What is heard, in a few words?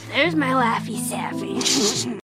Laughy